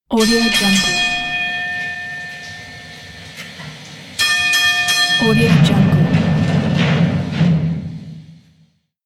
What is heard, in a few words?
mine ambience bell and elevator door closes